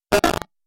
computer glitch

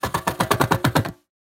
train going; soundscrate

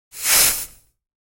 sci fi noise

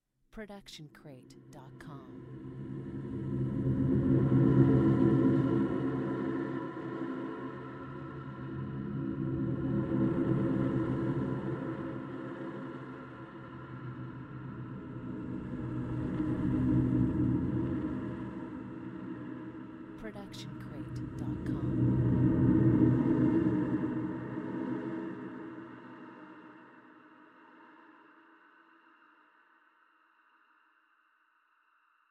ghostly wind; soundscrate

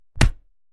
Fight Impact; SoundsCrate

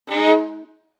Violin Scary Movie

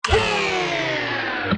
Robotic Motor; SoundsCrate

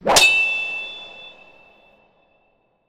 sword hits; soundscrate

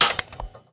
switch; soundscrate

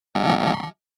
video glitch

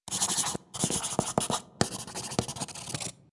pencil

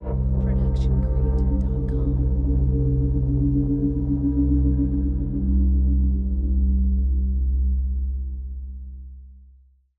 Suspenseful Ident; soundscrate

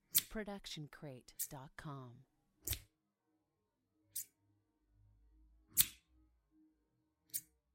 scissors

